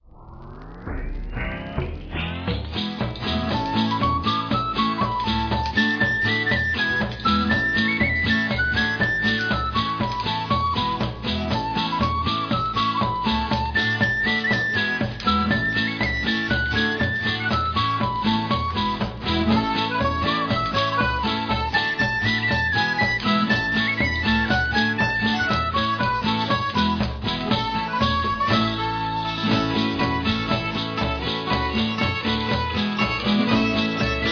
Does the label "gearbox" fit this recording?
No